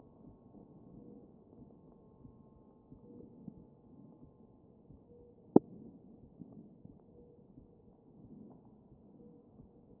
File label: chest